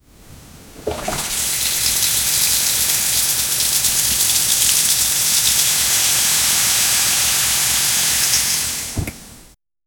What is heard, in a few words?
stealing, robbery